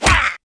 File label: zombie hart; ZombieBattle; audio zombie